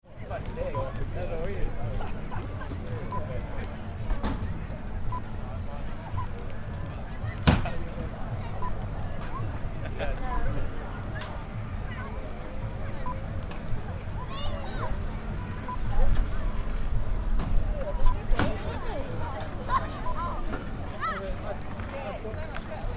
Music Festival Campsite

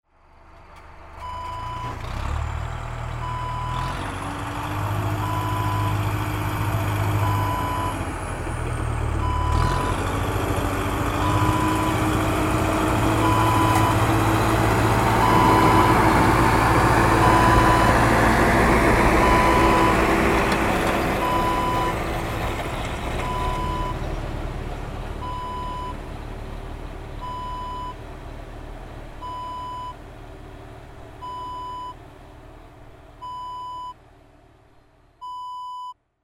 tractor pass by

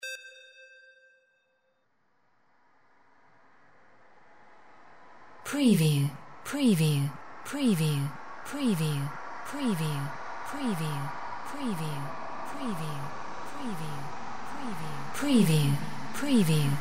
drone spirit swarm

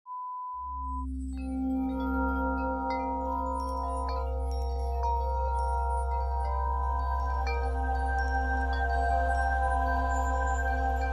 Angel Chimes

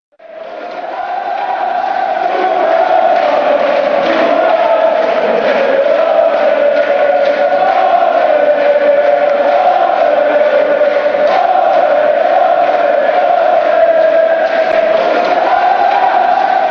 allez allez super deutschland, fan chants

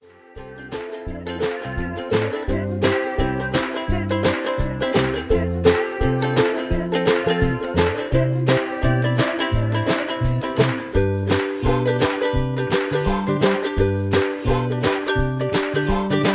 Ukulele

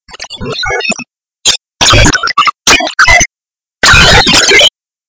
Scary laugh in stony hallway

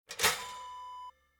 toaster lever down

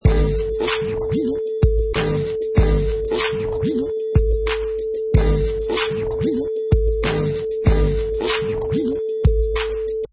Vinyl Bump Scratch 95 bpm with beat

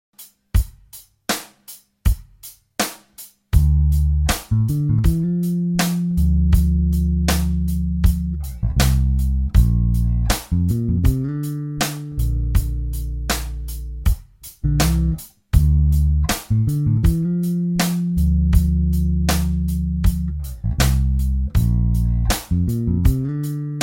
D minor to Bb major bassline

